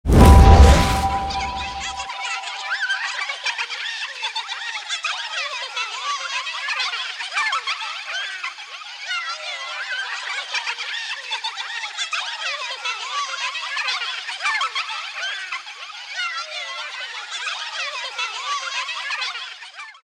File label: natures attendants; weapons; dota; enchantress